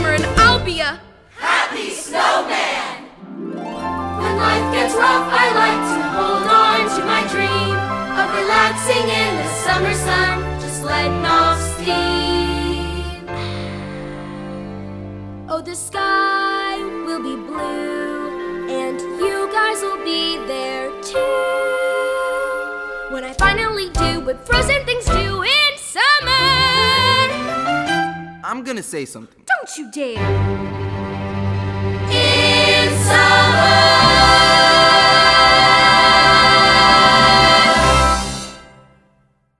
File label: with vocals